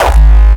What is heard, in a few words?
Hardcore Gabber Kick